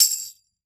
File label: Tamb; EdgeSounds; Drum Mashines VOL-1